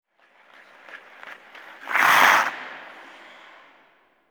Hockey Skate; iMovie Sound Effects

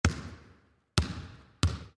Basketball Dribbling; Sports; iLife Sound Effects

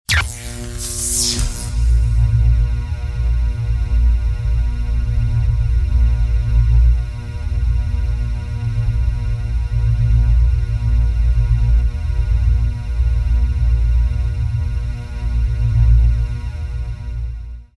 Warp Engineering, Sci-Fi; iLife Sound Effects

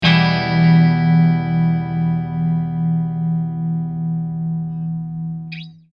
Electric Guitar Min Chord; iMovie Sound Effects

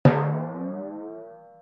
Cartoon Timpani; iLife Sound Effects

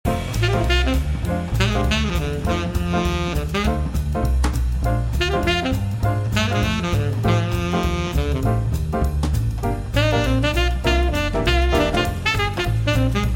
Swing City Long, Jingles; iLife Sound Effects

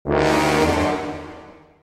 Suspense Accents, Textures; iLife Sound Effects

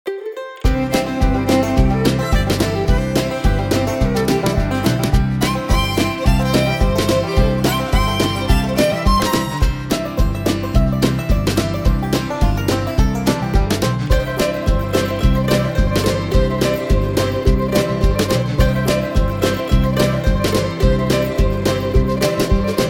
Campfire, Jingles; iLife Sound Effects

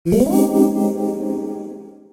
Ethereal Accents, Stingers; iLife Sound Effects

